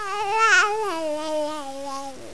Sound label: baby; PUPPIES